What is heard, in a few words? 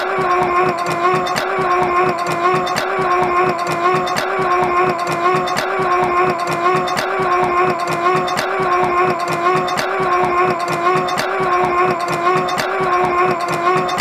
men moan